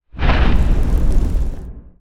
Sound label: Swipe